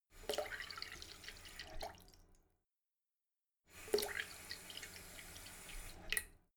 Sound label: sink fill splatter light short; haus-garten; badezimmer